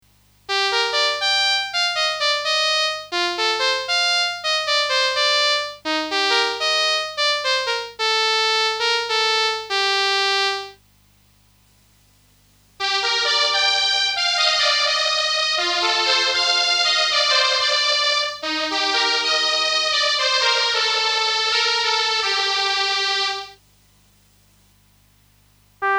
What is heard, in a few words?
Behringer Solina Trumpet Horn dry mod